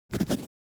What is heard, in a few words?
Scribble signature on paperwork